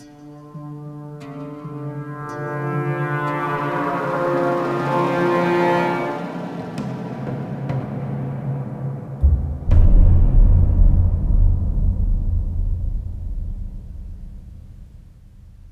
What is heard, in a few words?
Environment; backstab android ios gamerip 2011